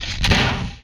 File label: Door